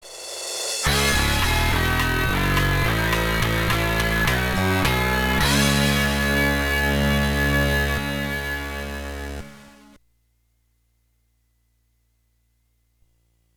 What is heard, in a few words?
Defeat; Dynasty Warriors Advance; gba